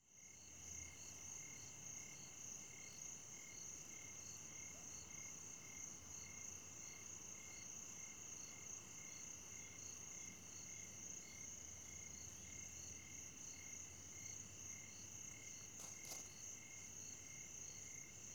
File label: African Bush After Sunset; Animals and Birds; BBC Sound Effects Library